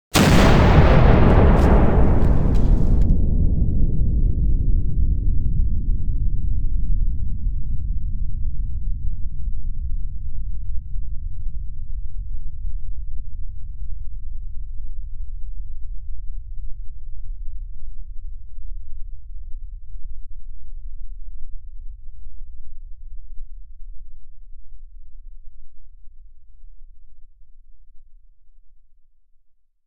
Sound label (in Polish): uderzenie w budynek